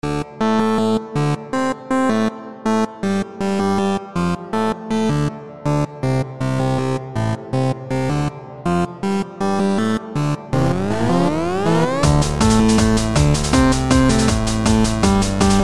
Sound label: Chiptune Test